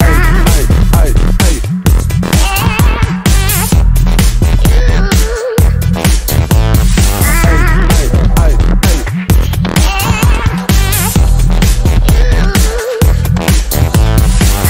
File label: showstopper music; fortnite emotes